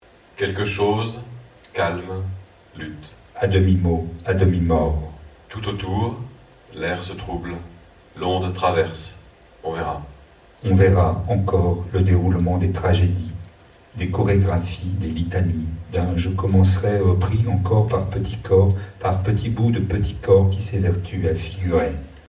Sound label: chant